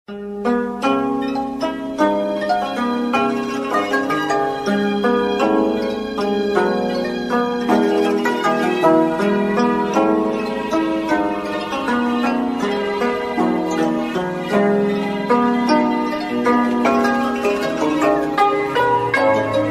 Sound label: midi ring